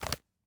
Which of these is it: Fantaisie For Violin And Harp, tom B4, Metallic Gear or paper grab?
paper grab